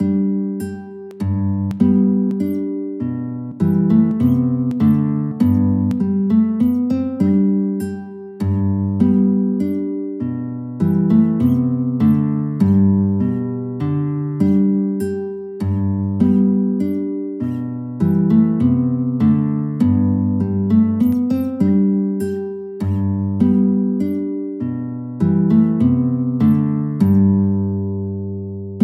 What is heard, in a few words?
Menuett, guitar